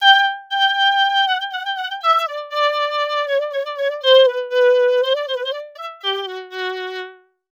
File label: violin